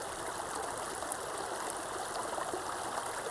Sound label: Waterway G Dark